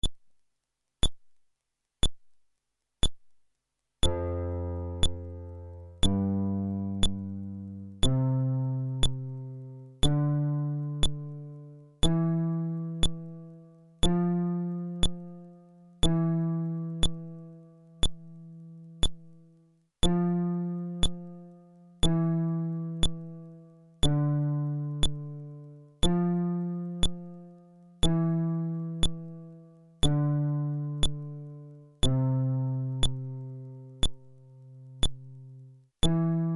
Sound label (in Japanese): フレットレス